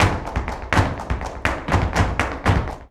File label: BULERIAS TAP, Flamenco